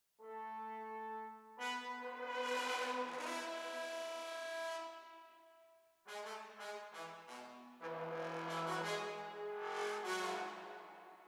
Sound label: Brass; starbites